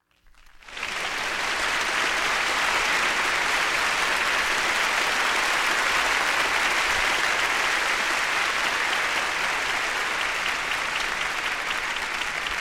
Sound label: clapping